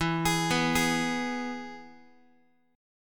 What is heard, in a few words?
E-Major E, Guitar; arpeggiated; JGuitar